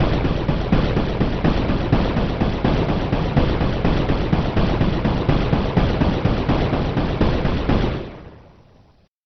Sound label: gun shot bass